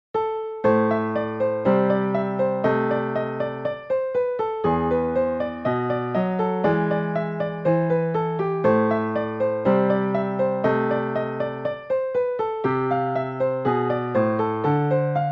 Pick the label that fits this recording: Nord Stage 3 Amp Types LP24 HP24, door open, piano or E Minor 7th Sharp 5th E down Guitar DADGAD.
piano